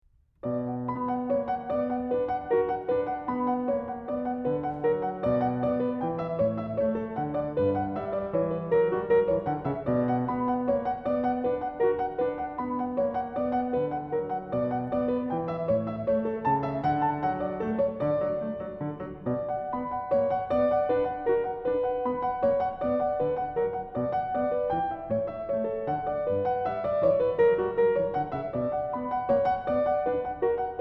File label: menuet, french suite